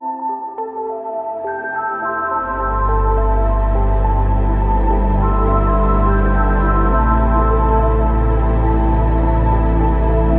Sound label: Ambient Atmospheres